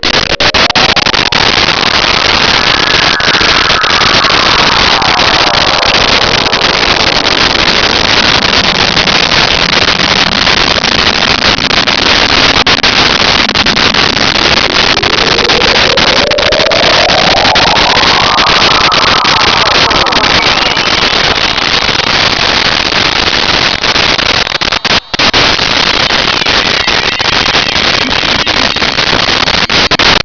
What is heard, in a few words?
scifi; Virtual dj sound effects and plugins; Sampler